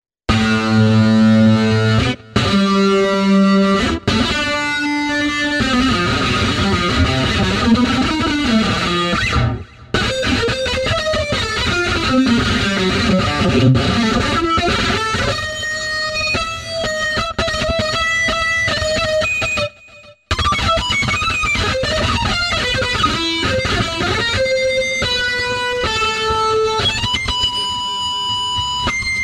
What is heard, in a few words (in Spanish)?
GUITARRA HELL SOLO